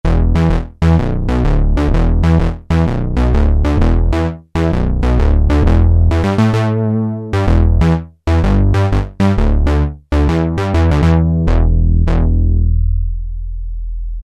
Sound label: MOOGY BASS; Kurzweil K2000 Best of Analog